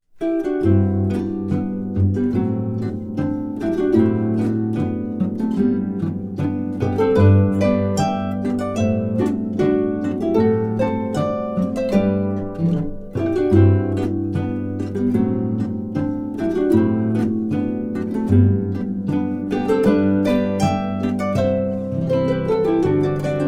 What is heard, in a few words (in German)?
Boarischer